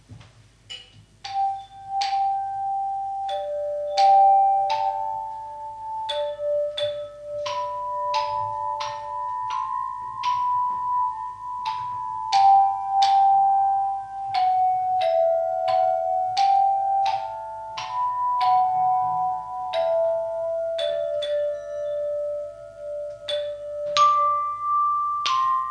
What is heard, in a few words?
handbell; izuminow